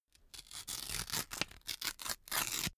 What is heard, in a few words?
notepad sheet tear up